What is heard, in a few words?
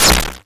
Snare, YM, CHIPSHOP HITS DRUMS; ChipShop Deluxe